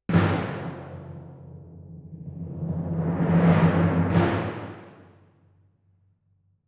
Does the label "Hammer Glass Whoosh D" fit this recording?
No